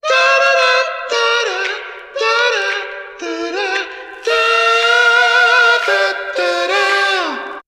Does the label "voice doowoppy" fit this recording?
Yes